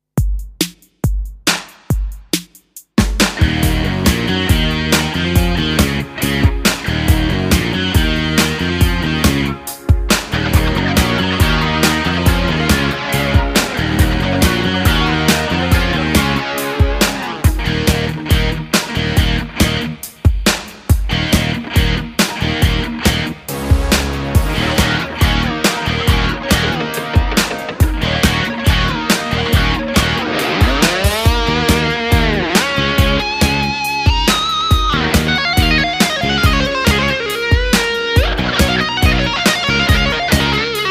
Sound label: solo